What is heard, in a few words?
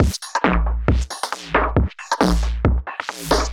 Beat Mod, 136bpm, uk garage